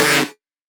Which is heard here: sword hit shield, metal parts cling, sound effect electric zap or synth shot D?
synth shot D